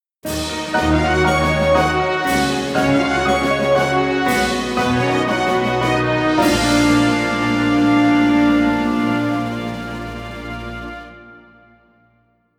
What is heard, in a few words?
Victory Fanfare; Defender's Quest Valley of the Forgotten OST